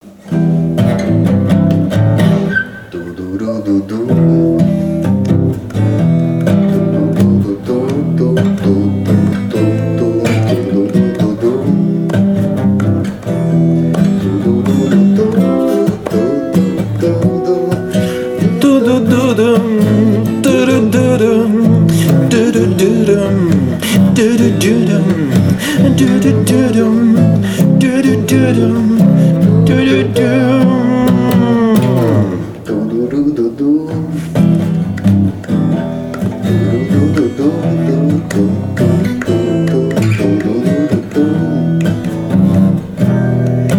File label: Vocaliz